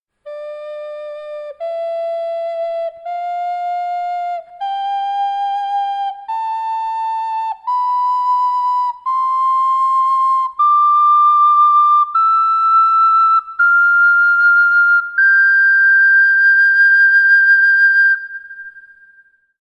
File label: duet minor